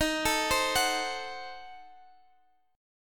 Eb Diminished 7th, Guitar Open F; Ebdim7 arpeggiated

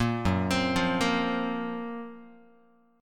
Gb Major Sharp 11th, Guitar; GbM#11; arpeggiated; JGuitar

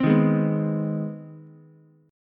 C Minor Major 7th Sharp 5th chord, Ukulele; strummed; JGuitar

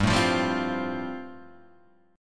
G 7th Suspended 2nd Suspended 4th G chord

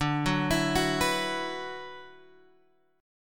D 6th Add 9th D, Guitar, Open G

